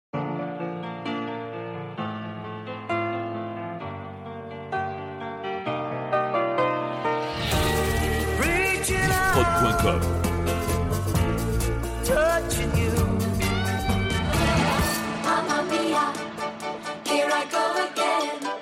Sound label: Medley 60s